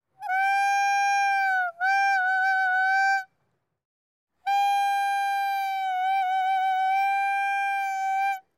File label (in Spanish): CORNETA DE HELADERO 2 TOQUES; bocina